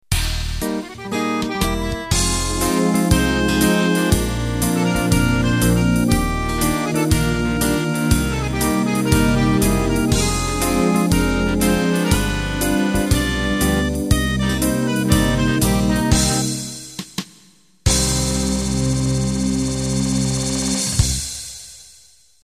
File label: Euro 2Beat End